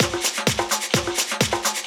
Latin Loop 128 BPM

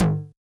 Yamaha DJX 2B, Hard Tom

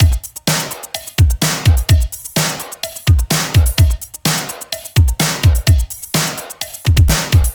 Retrofit Drums, 127bpm; Zero-G - Soundclash Nu Dance Electro; Construction Kits

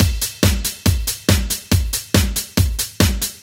Addon Loop, Drum Loops 140bpm, Perc Loops; Zero-G Trance Inducer; 90 s Sample CDs

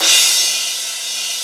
Cymbal 14# Crash

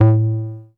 Tonal Moog Bass; Big Fish Audio; Old Skool House; OSH Bass

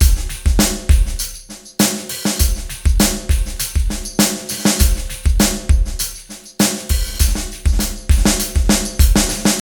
ALPHA BEAT 100

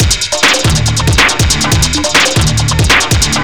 Overdrive, Drums Beats

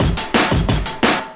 Tin Pad 175, Drum&Bass Breaks; EZ Rollers

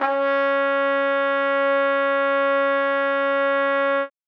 trumpet c#4, Trumpet mezzoforte; Session Instruments

